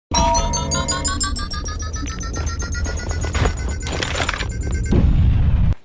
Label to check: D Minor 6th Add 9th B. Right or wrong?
Wrong